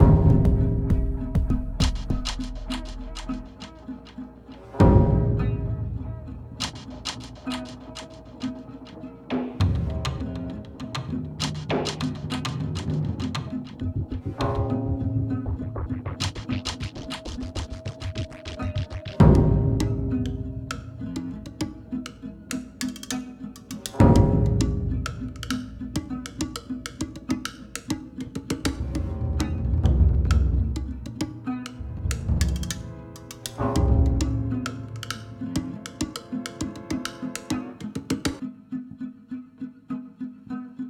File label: Music; Sifu